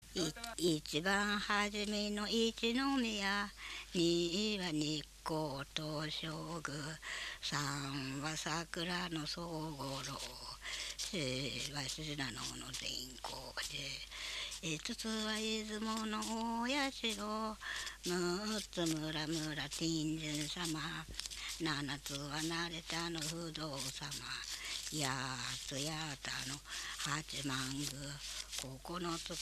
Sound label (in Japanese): itibanhajime, minyou; 一番はじめ; 八街市